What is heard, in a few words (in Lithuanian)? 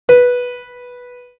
fortepijonas